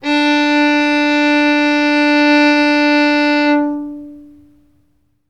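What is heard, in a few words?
reference pitch fiddle d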